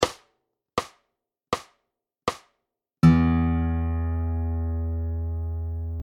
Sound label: SLOW, fingerpicking patterns